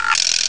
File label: dice; HBMonopoly